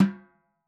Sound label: dhol Single hit; Raw Perc One Shots; Slate; SD SEISMIC Drum Sample Pack